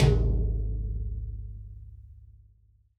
TD-20 Tom B, Roland TD-20 Toms

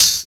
DIRT HAT2; East West; Phat & Phunky

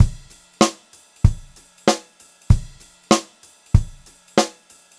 96 CL VARI 6, Pop-Rock Drumloops; USB Soundscan vol.37; AKAI; 90 s Sample CDs

